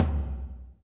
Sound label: TOM TOM, Roland